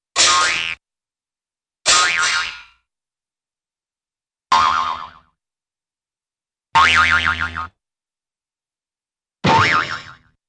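Cymbal Crash & Boing - Quick Stop with impact (jews Harp), Cartoon; Hollywood Edge; Cartoon Trax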